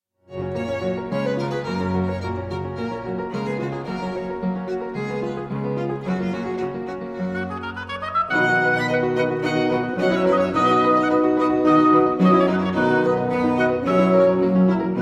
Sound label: sextet for oboe 2 horns violin cello and keyboard; 1st mvt